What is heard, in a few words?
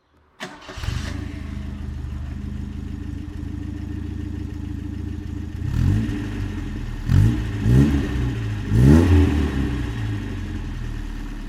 Opel Ascona 1700; Opel Ascona 1700 - the little American from Switzerland